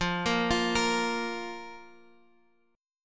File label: Bb 5th F